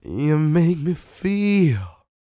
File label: croon, Vocal Planet Blues Soul; Spectrasonics